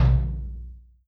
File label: TOM